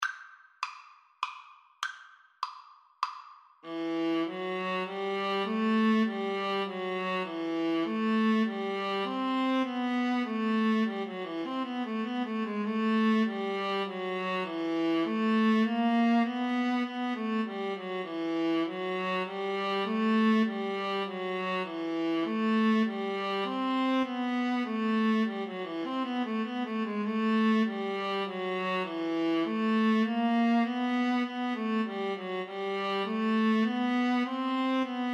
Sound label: Minuet viola duet